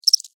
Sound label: Insect Cricket Chirp Single; Insect Voice; Animals; Insects